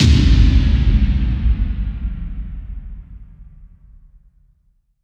Hits & Explode

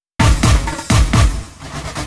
Fill 128 BPM; Vengeance Samples; VEH1 Loops

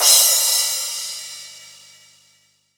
Crash, Cymbals

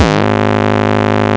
Bass, Root G#; Vengeance Samples

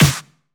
Pre-Shifted Claps; Vengeance Samples

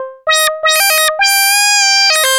ARP Odyssey, Arp A Line, synth samples

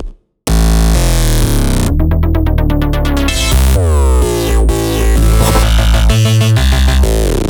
Economic Melody Root A, 128BPM; Vengeance Samples; Economic Kit

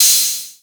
OP Hihat; Open Hihats

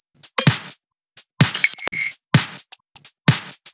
House loops 128BPM; Vengeance Samples

